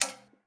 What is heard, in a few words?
Lamp Active; MiSide